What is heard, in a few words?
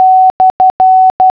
slash, morse; The Numbers Racket CD-ROM